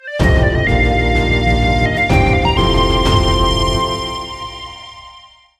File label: Take Awesome Item Short; B3 Game Expo for Bees; wii u